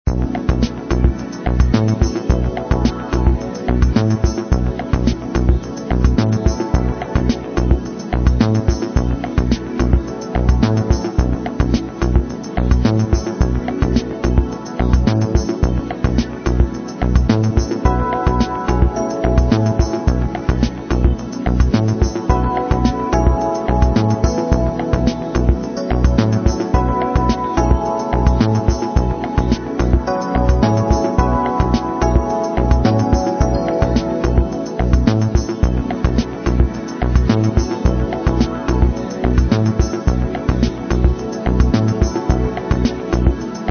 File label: Little Summer Loop